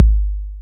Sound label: TR-808 Kick